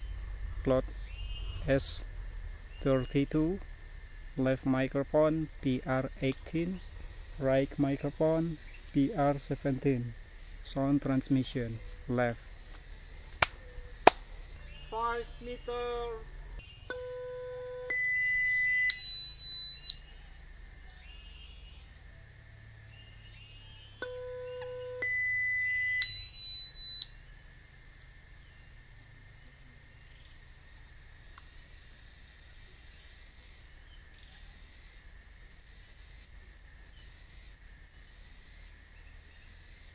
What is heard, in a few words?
birds